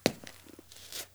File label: zombie footstep; Project Zomboid v41.73